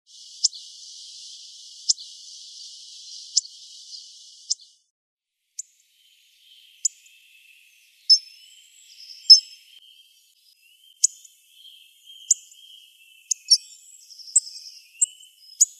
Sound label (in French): Cri et chant du Gros-bec, Sons très secs, répétés calmement, peu perceptibles; ATLAS NICHEURS BRETONS 2004-2008